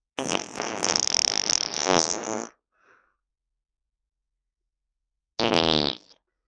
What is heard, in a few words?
farts